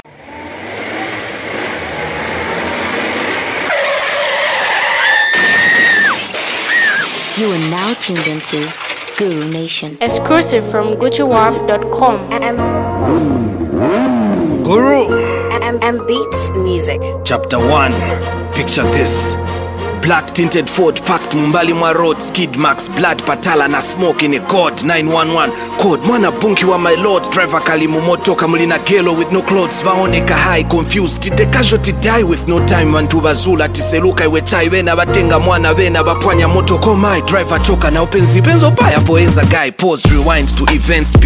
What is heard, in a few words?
rap